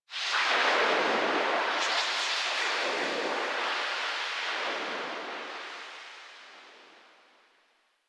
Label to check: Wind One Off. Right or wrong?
Right